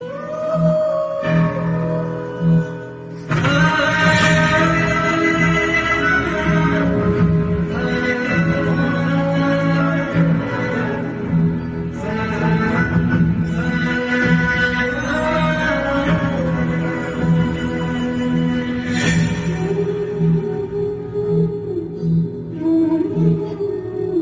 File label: Flute; Dabang 3